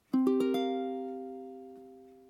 chord c f g ais, ukulele

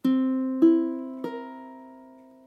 chord ceb, ukulele

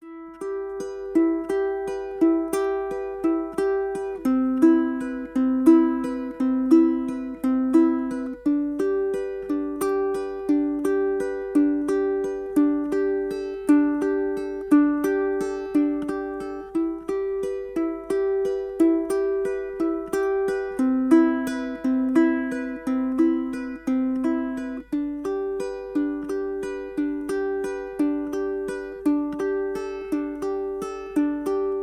akkordprogression g, ukulele

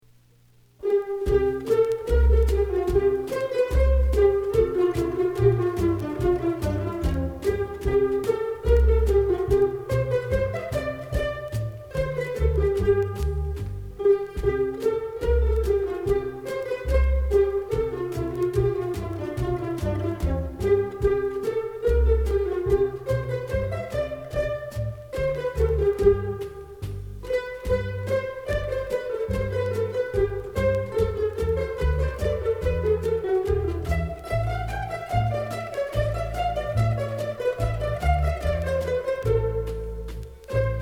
bourree for bach, ukulele

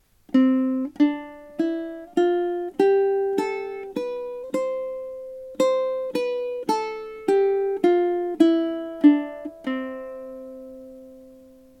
c scale, ukulele

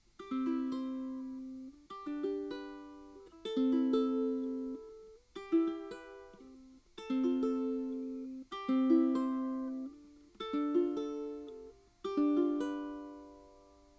pachelbel mod, ukulele

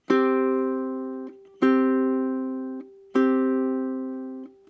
chord c g, ukulele

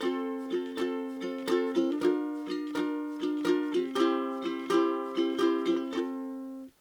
shuffle 34, ukulele

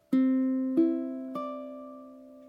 chord ced, ukulele

